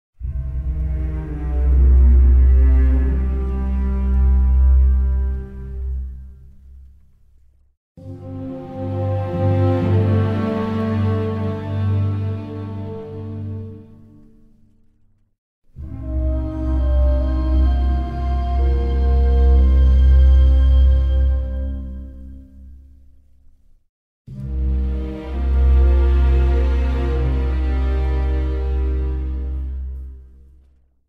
Death Jingles; Call of Duty 3; PS2; PS3; Wii; Xbox; Xbox 360

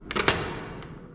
Dungeon Door Metal Handle; Idle Champions of the Forgotten Realms

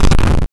Orbling Hit (Sound); Gloom

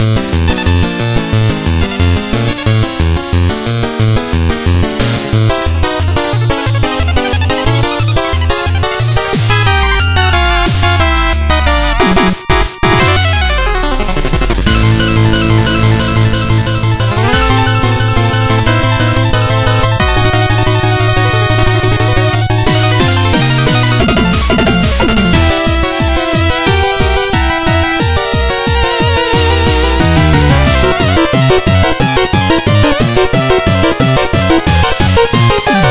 Music Armory Battle; Shovel Knight King of Cards

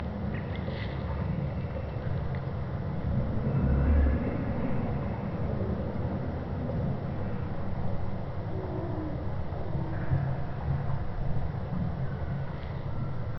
Ambience caves; adams venture origins

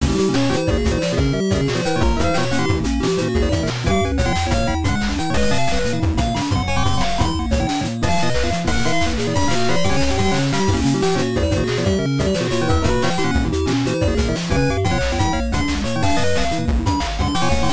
8bit; Hina-chan Maize Tower; Toho RPG; android; bug

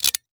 Tactics Handcuffs

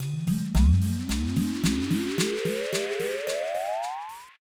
Jingle Level Start

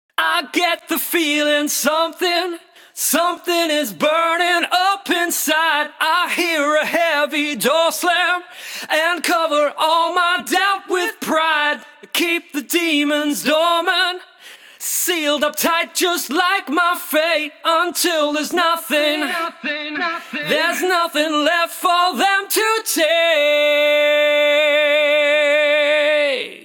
Special Boss Loop Vox; Spark the Electric Jester 2

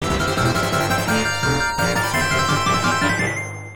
Stage Clear; Three Wonders; arcade; Chariot

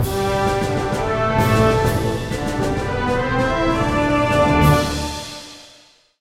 win; Arcane Soul; Android game music

